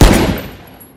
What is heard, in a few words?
key press; Clicking Head